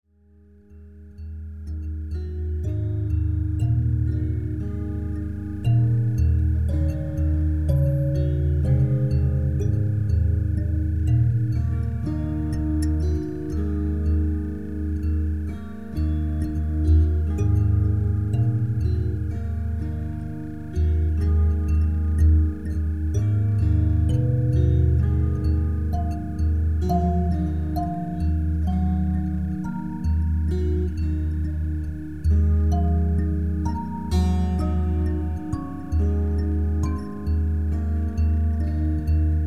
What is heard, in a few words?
array mbira and array psaltery